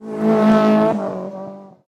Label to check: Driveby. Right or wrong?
Right